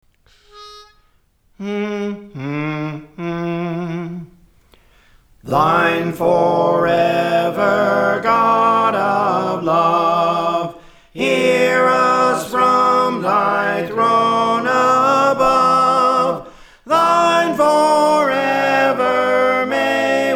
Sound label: Soprano; Thine Forever; Praise For The Lord Training